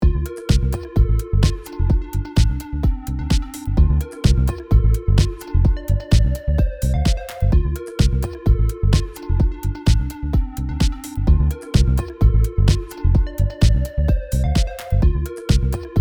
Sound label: Prog House Dry Wet